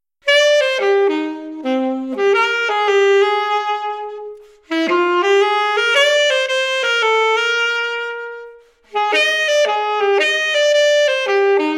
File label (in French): saxophone